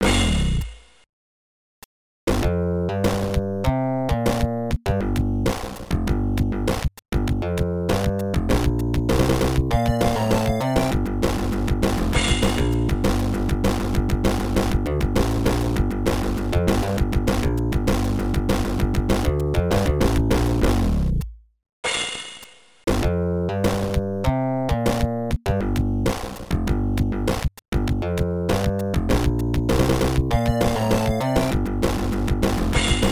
Circus Performance (Solo); Tempo; 32X

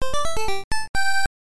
Extend Music; nes; Dig Dug

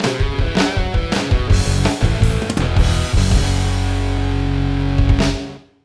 Sting Boss Defeated; X-Men Origins Wolverine; NDS

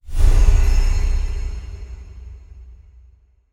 UI skill tree unlock

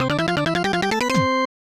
Unused Jingle; Super Double Yakuman 2; SNES

